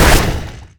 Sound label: attack impact; weapons; dota; necrolyte